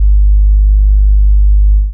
Sub Bass Drum

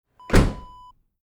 car trunk close

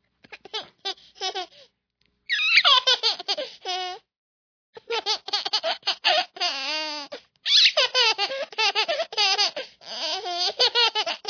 happy baby

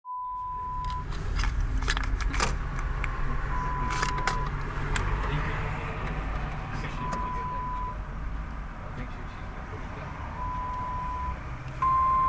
ATM sound

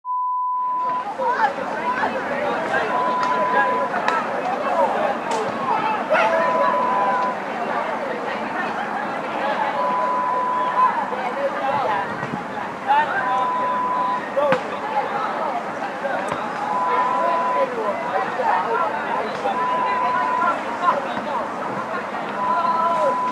Playground sound

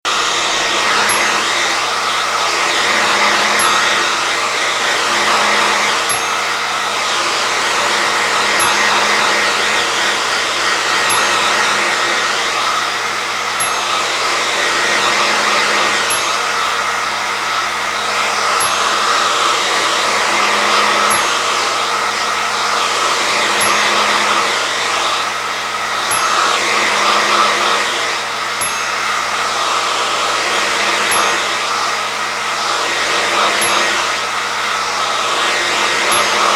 Blow Dryer

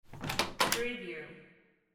door open

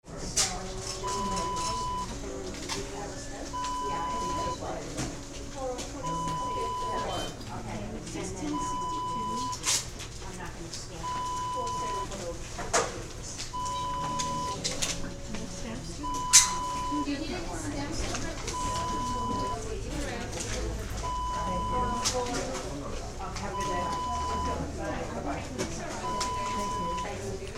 post office

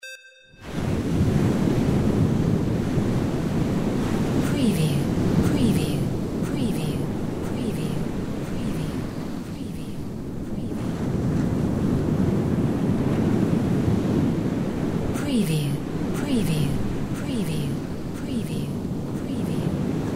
seashore distant; windy beach audio

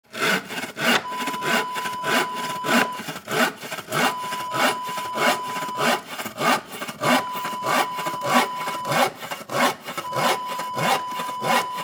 handsaw

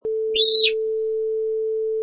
Little Girl Shriek

